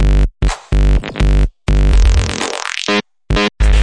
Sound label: 125 killa shuffle bassline, BASS LOOPS